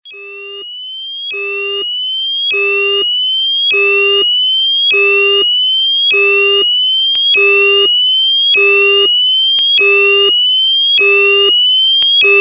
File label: Phone Alarm; I Phone